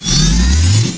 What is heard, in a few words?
PowerUp out; Apache; Slide Sounds